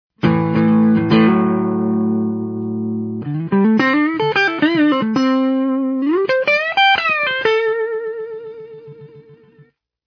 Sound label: compressor, effects